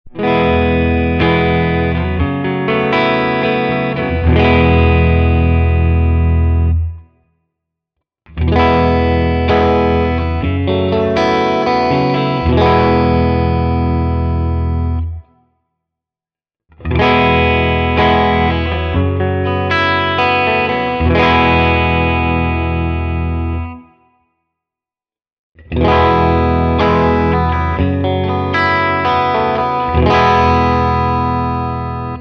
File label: Clean Chords All Positions